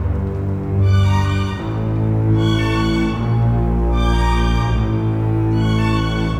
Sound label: Cinematic Strings, Orchestral